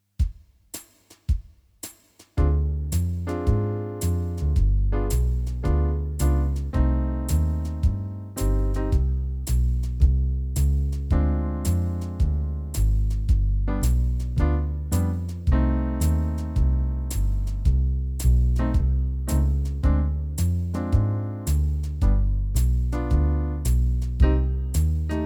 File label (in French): accomp II V I min